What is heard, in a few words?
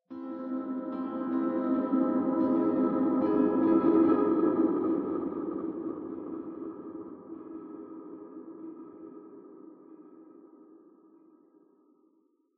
Piano Disaster